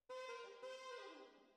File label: Bari, RNR for piano